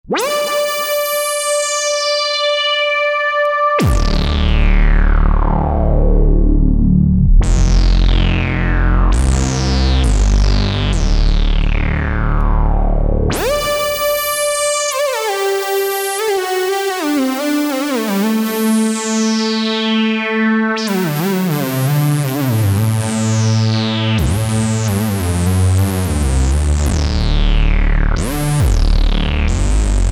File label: Mogue Lead